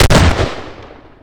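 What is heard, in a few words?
shotgun double fire distant; shotgun dbl fire7 zm dist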